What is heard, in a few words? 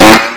explode; bark fart modpack